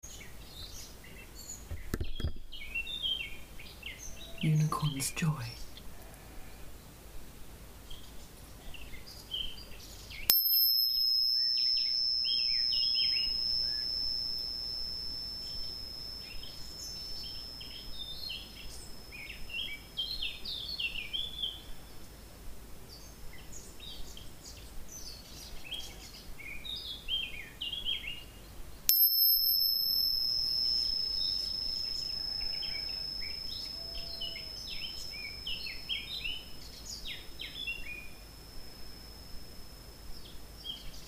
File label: Joy bells